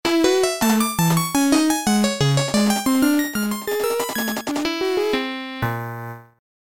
Final Lap Fanfare; mario kart zr ds gamerip 2014

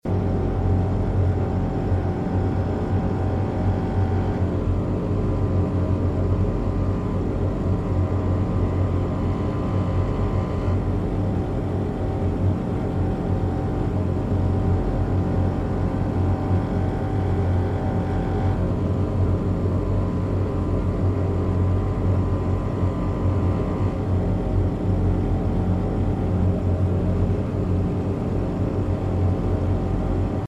Level 3 Zone 1 Ambience; escape the backrooms

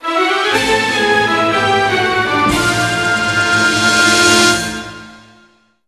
event battle; SD Gundam G Generation Spirits; ps2

